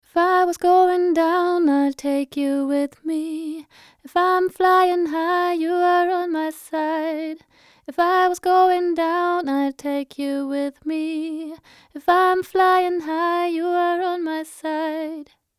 Vocal Enhanced Color; Fredenstein F610 UE1

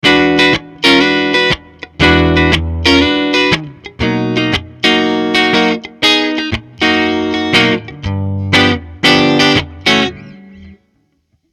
Neck Pickup; Fame The Paul

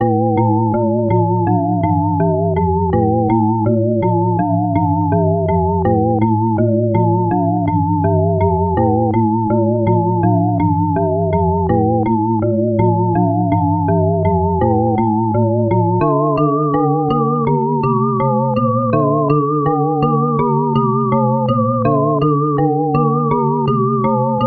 SkyDust 3D Key Sequence pitch detuned attack; Sound Particles

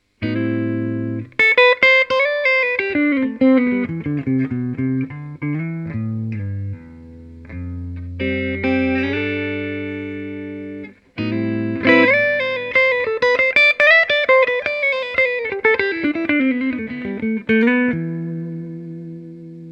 FW15R Clean

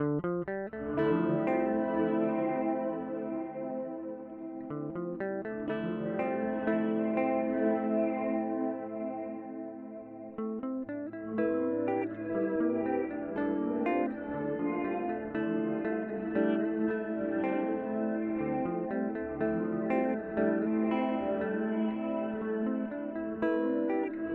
Eventide Blackhole Reverse

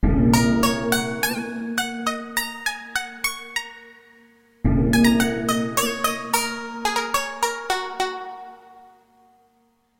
Temple Gong; Shami

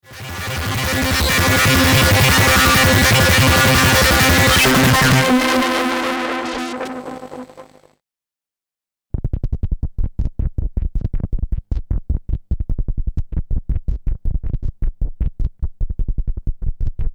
Bass Drone; Stramp Synchanger

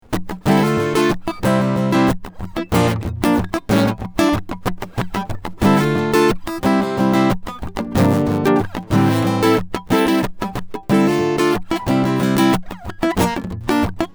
Strumming EQ Middle

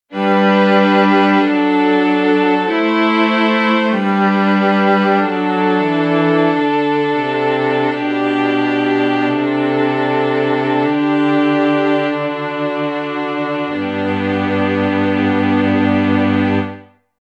Roland Chamber

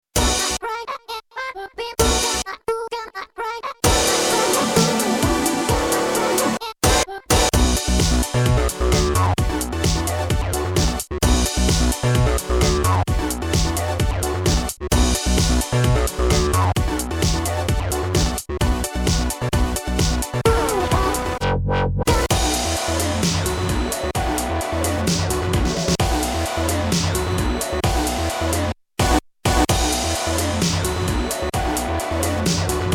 Casio XW PD1 Dubstep

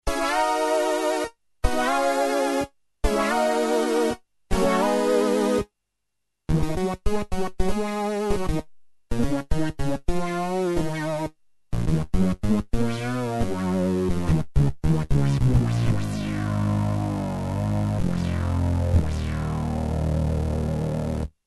brass

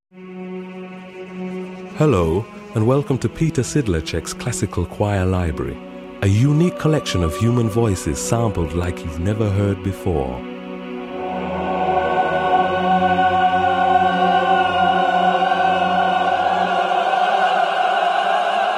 Classical Choir; Best Service

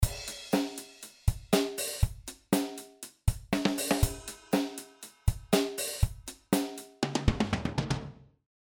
country kit

